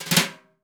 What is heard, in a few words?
brush; Northstar - New Gold VOL-2; 30S BRUSH